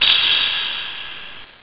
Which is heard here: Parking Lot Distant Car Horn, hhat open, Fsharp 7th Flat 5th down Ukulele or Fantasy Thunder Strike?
hhat open